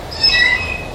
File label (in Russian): svistyashij krik